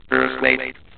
Earthquake; edge extended; android gamerip 2012

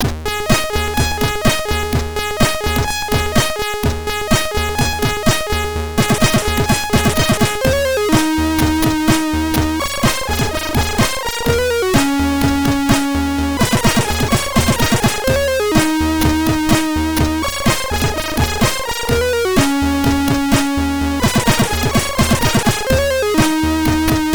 (SID Stereo); Midnight Resistance; Commodore 64

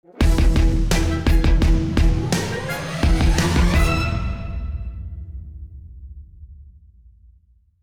Sting Loss

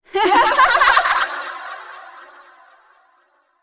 laugh; yami

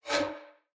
Menu Open; Portal Quest; android; SFX Ui Filter Menu Open

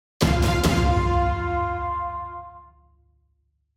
Sting; beast quest; ps4; Arcta

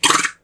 gun

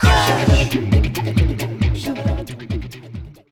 Music - Race Fanfare

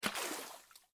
object In Water

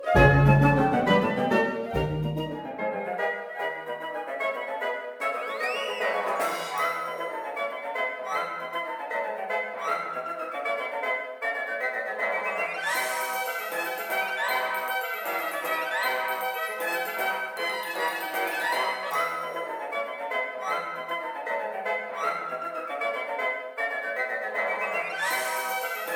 Potion Shrink hero; Shrek 2; GBA; GC; PS2; Xbox

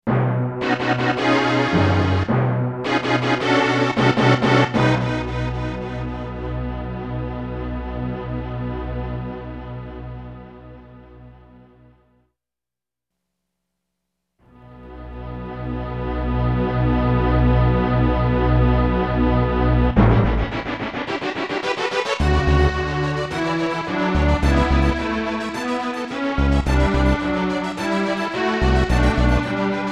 Last Boss Clear Game Clear BGM; Nintendo Power Play It Loud; Last Boss Clear~Game Clear Demonstration BGM

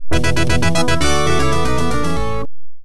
Jingle, pinball; Taxi Williams pinball

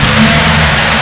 Jumpscare; Partypooper and Faceling; backrooms 2d android ios online windows gamerip

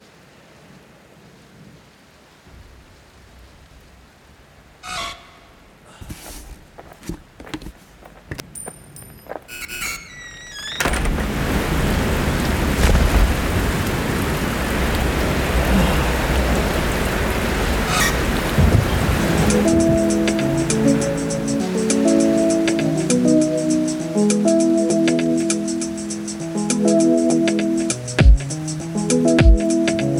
Lofi Remix; Pokemon Lo-Fi Megamix 2020

